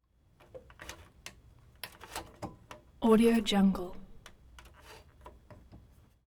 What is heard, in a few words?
levers lawnmower metallic clicks movement